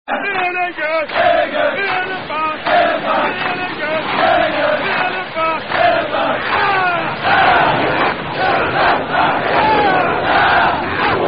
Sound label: Cadence Chant, USMC Boot Camp Platoon